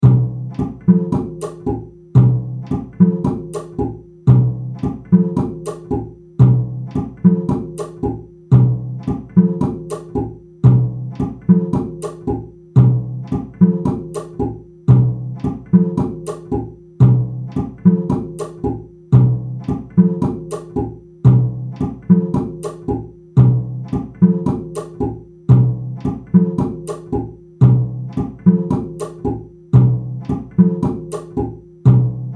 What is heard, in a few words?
start loop